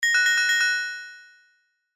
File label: piropiropiron